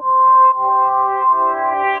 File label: Peaceful; Inspired by Nature by Dillon Bastan; Vector Grain Presets